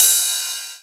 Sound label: HAT, Techno 138 BPM; Best Service ProSamples vol.54; AKAI